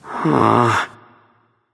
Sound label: sigh; smite; nezha